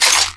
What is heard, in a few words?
glass, footsteps